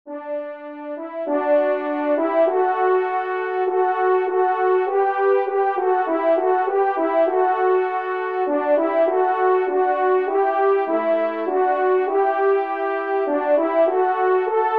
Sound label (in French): Entree Do Maj; Corrette